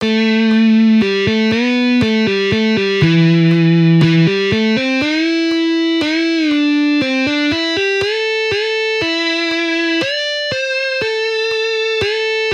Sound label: Minor pentatonic, guitar